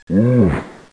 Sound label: ooph; VPHYSICS The Eggs Of Time